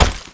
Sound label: grunt asphalt run